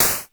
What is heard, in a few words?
KLONE NOIZ, Klone Dual-Percussion-Synthesiser, HHAT; Drum Machines